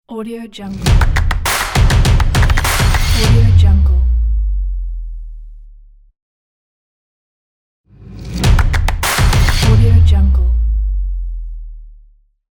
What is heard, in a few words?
claps and drums logo